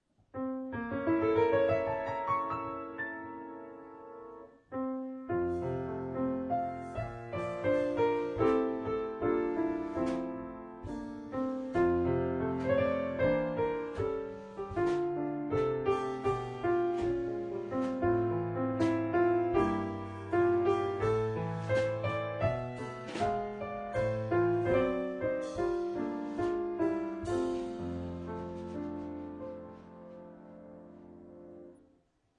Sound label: piano drums